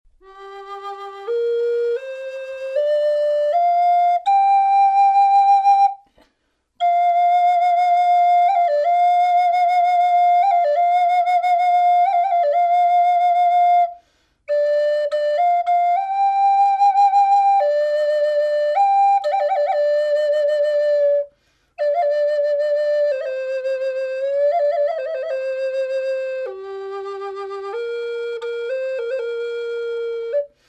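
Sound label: natural, flute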